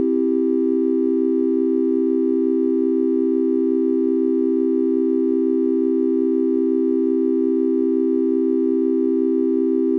twelve equal major

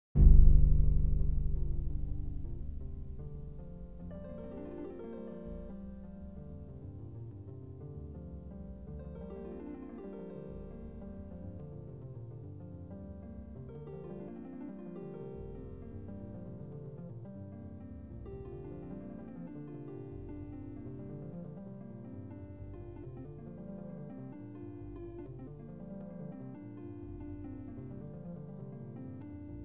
seven limit slendro harp

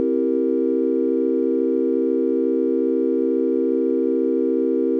12 et dom 7th

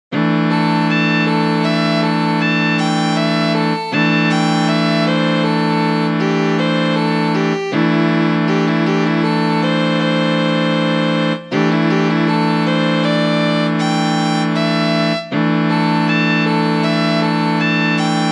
17 tet hurdy gurdy player

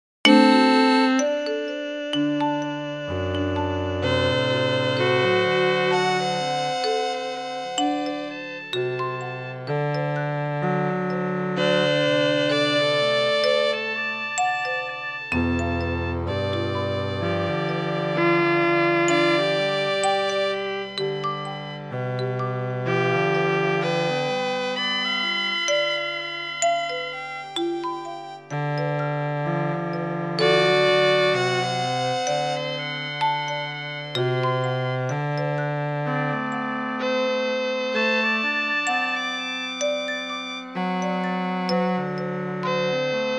quintet; squaring the octave

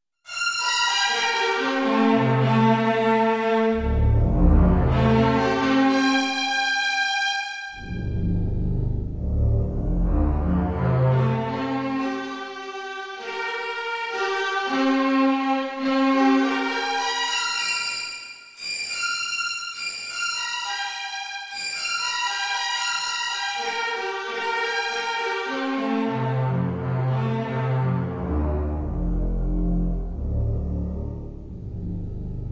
giants synth

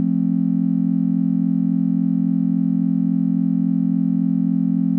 just triad

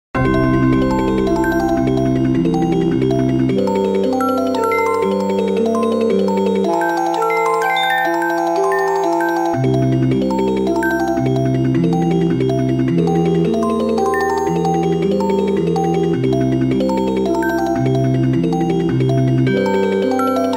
slendro with detuned octaves

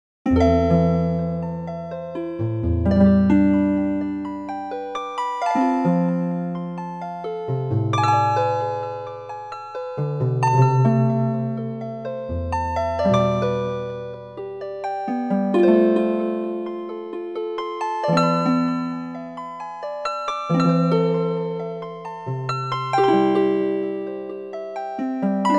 greensleeves chords just intonation harp septimal

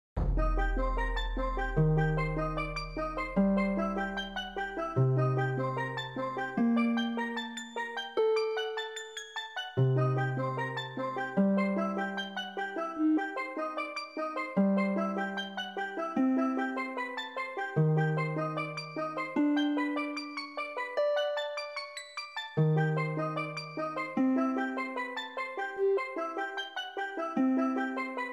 ripples; fractal music

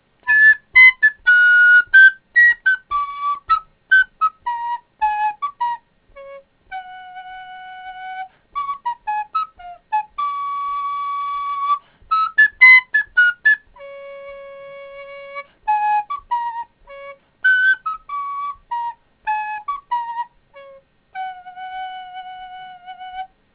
minuet

